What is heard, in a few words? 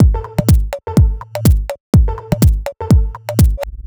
D 124bpm, House Drumloops; Zero-G House Fabrik Drumloops